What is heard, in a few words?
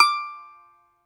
BAGLAMA D0TL; E-MU Producer Series Vol. 7 – Old World Instruments